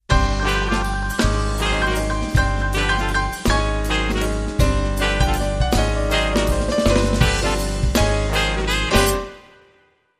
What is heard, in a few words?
Jazz Brass, Jingles